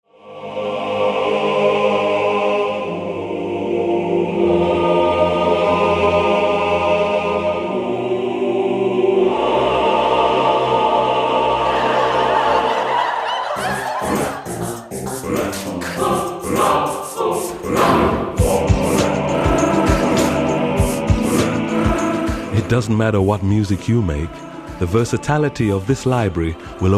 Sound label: classical choir; peter siedlaczek